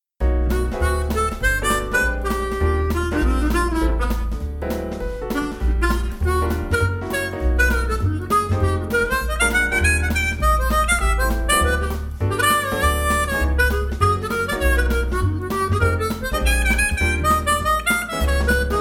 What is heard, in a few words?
Chords Impro, Seydel